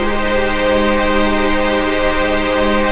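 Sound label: chord pad, Pads; Best Service; XX Large - Pads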